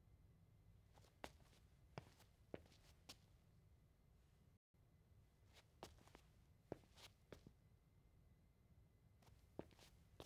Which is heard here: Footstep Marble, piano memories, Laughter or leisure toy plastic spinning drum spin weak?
Footstep Marble